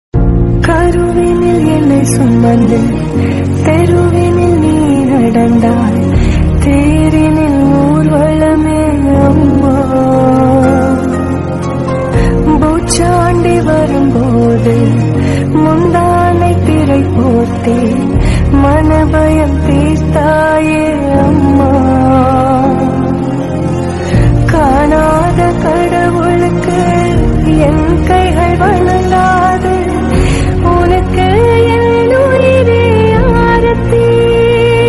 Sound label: Song Ringtone Tamil